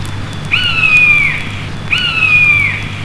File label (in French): Bird Buse